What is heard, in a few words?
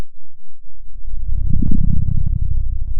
Sound of a dinosaur